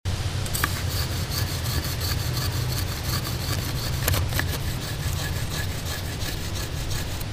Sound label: Triplet Scratch